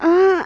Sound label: die thief female